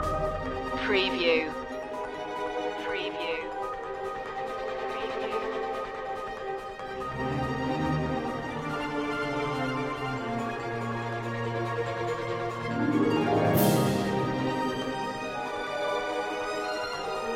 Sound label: voices on the wind loop